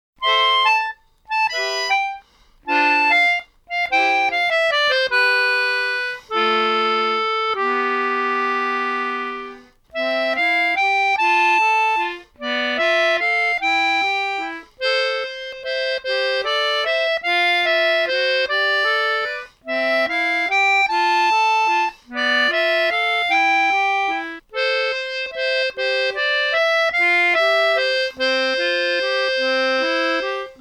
Accordion Version; Ojamajo Doremi

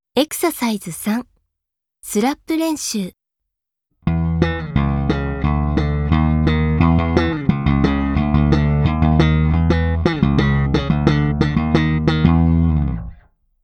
Slap; Slap Renshuu

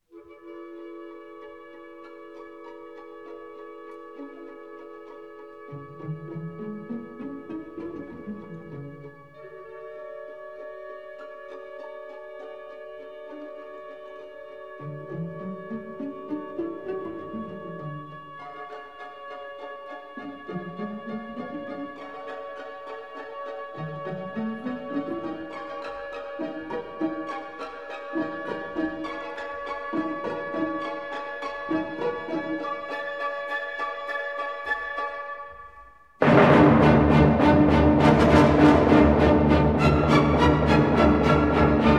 Ninth Symphony D minor second movement; Bruckner; Legend of Galactic Heroes; Galactic Empire SIDE

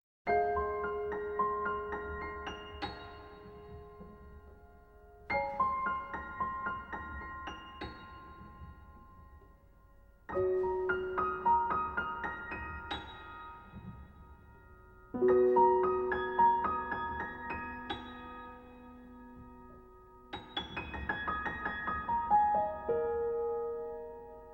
piano Ver.; Tetsuwan Birdy DECODE

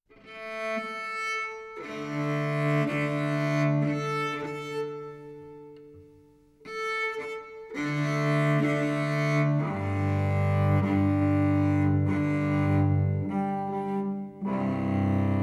Cello Tuning, Cello Forth Chord, Tuning Chord; Neon Genesis Evangelion; Evangelion S2 Works

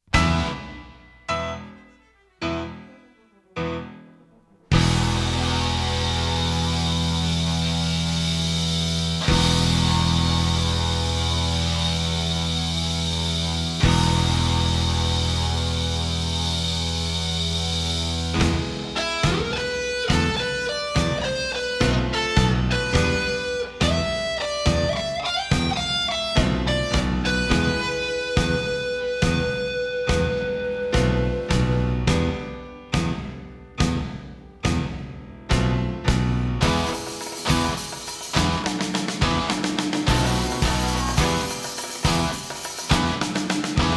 fast tempo, rhythm only; Neon Genesis Evangelion; Evangelion S2 Works

